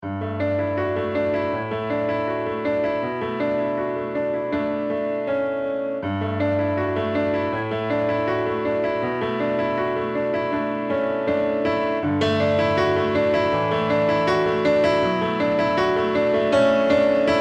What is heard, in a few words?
keys ambi; addictive keys